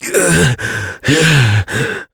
Death, Adult; New War; Operator

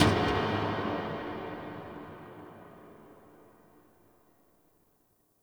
Creepy Metal Swell Clank; Warframe; Entrati; Dante Unbound Leverian

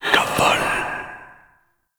Fire Whispers; Weapons; Warframe; Tenno; Grimoire

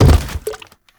Gas Tank Impact; Gameplay; Warframe; Nineteeen Ninety Nine; PvPvE

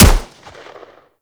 M16 Rifle Shot; Warframe; Grineer

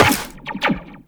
Step Zipline, Footsteps, Walk; Warframe; Characters; Tenno

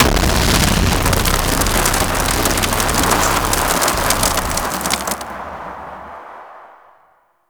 Fire Blast; Warframe; Sargas Ruk

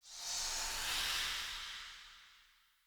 Short Sword Throw Wind Up; Weapons; Player

